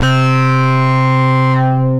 Bass; Stray Cats Collection by Max for Cats; MSE Presets

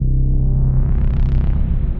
Space Drone Bass, Basses; Ableton; Creative Extensions; Racks

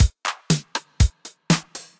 Kit 707 Studio, effected drum kits; Drum Machines; Ableton; Factory Packs

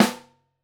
SN1 Overhead, Snare; Ableton Foundation; Snare Designer

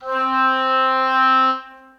Oboe Section Legato, Orchestral Woodwinds; Ableton

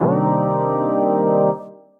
Fm Chord, Synth Lead; Ableton; Skitter and Step

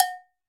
Cowbell B, Latin Percussion; Drum Hits; Ableton; Factory Packs

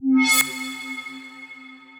Metal Scrape; Effects; Ableton; Factory Packs; Punch and Tilt

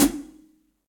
Hihat Foot Low Loud Squashed, Drum Hits; Standard Hits; Ableton; Factory Packs; Session Drums Club

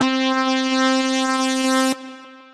Funk Lead, Synth Lead; Herbie Funk Lead; Ableton; Factory Packs; Chop and Swing

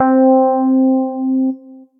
Wallah Keys; Piano & Keys

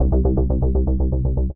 FM Harp Bass Pulse; Ableton; Mood Reel; Tense Pulses

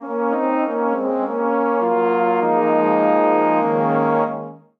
Phrase Noble C# Major 100 bpm, Brass Quartet; MIDI Clips; Ableton; Spitfire Audio

